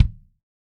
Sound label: DW Green Maple Kick; Sonic Foundry (Sony Creative Software); Vital Drums